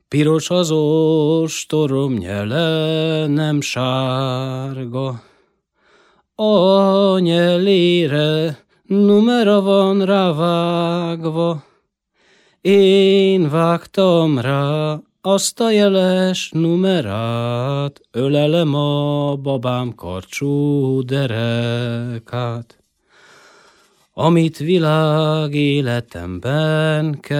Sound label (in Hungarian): nepdal; piros az ostor nyele; nepdalok